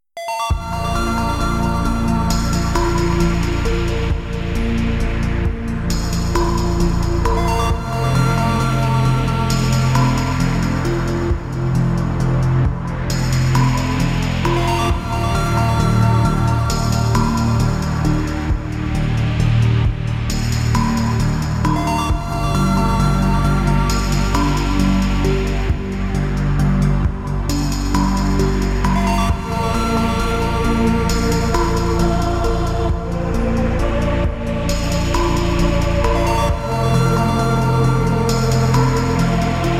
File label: ocean waves and square waves